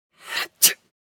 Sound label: Sneeze; Sneezing (Sound Effects:Human)